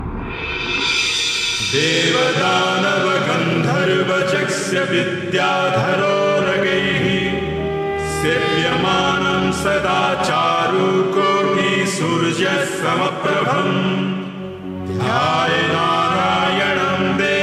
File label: Mantra Ringtone